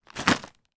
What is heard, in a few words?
skittles sweets shake in packet